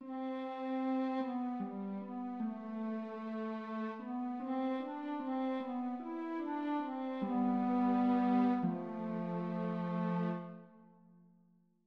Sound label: lose music